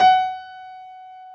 PF F#4 L, B GRAND PF

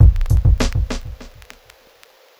drbreak, drumbreaks; Masterbits; Vinyl Attack

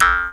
jaw, Didgeridoo and Other Primitive Instruments

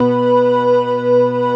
ORGAN, DANCE

